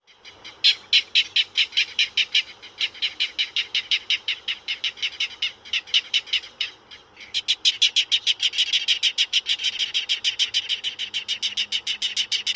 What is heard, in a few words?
rapid keks of California subspecies